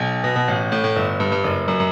Disco Fever

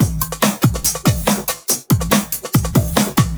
Break Beats, 140 BPM

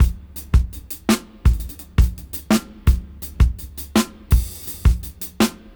Funkragga 82 BPM; AMG - Keith LeBlanc Phat Butt Jams

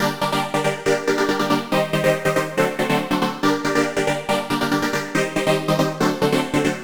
Arp 140bpm G, Trance

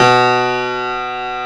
PF, GRAND PIANO; AKAI S6000 CD-ROM - Volume 1

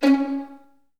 VN FX, Virtuoso Strings

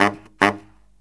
Killer Brass Riffs

